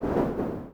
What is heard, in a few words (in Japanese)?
huton; LcdDem SFX